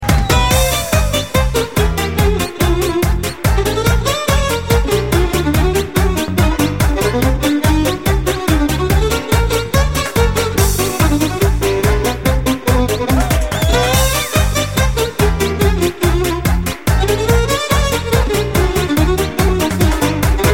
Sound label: Ringtone Mobile